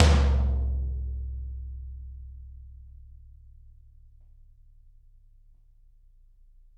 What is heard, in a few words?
Toms, Drums; Bob Clearmountain Drums I